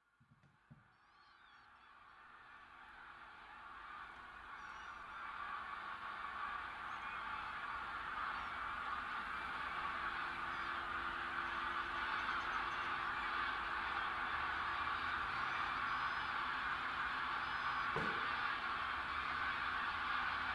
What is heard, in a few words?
greylag geese flying in to roost dusk; staveley